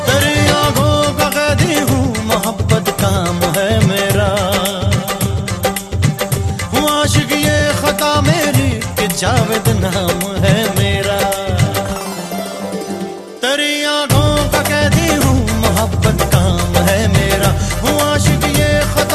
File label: Old Song Ringtone